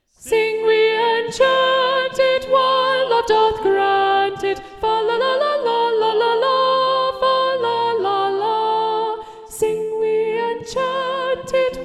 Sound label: SATB Soprano Predominant; Sing We and Chant It; Thomas Morley arr Russell Robinson